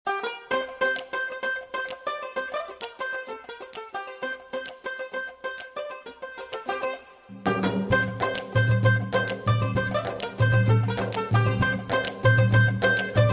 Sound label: rare bgm, ringtones